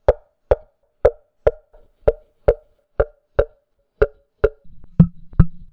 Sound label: big glass compare, Cup drum; russia 2011